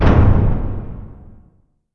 Clank

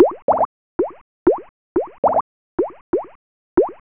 sink; Battleship 2.0

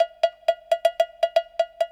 PERC LOOP ETHNO 125

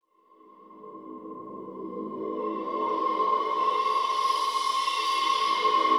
sphere ambient 160

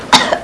cough; Sound Library 2000; Wayzata Technology

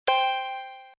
BT PRINT

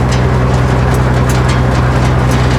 MILL; RallyC; CD-Gamer 2000-05

